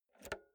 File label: insert jack multi socket